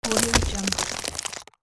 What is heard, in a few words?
crunch sound; andrewsound83